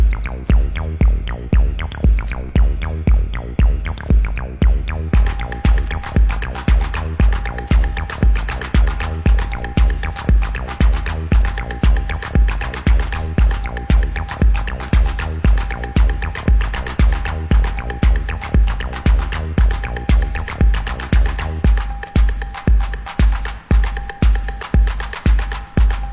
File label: instrumental mix; house